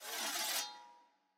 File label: ambience room polygon cut training